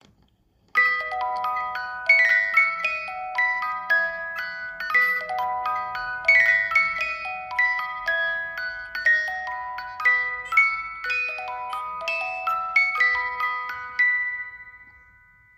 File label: 18 lames beethoven concerto no5, boite a musique; Boîte à musique en bois personnalisable